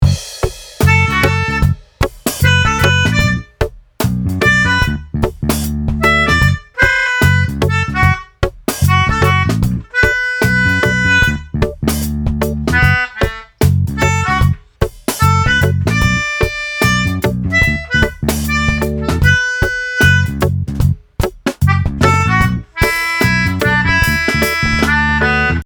Logic Ext Dry

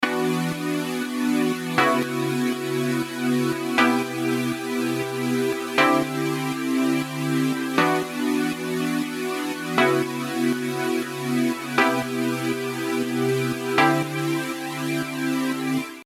Chords

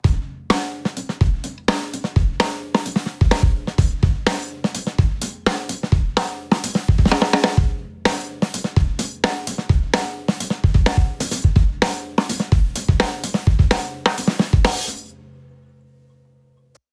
BOOST

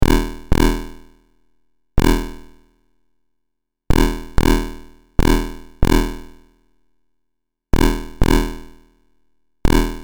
8bit kick

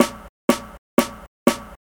snare

